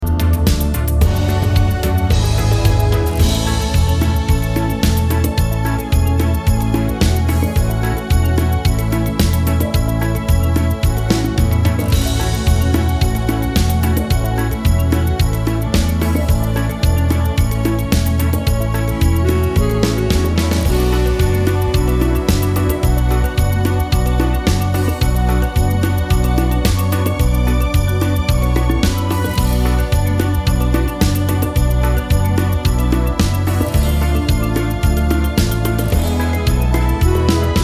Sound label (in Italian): vers cumbia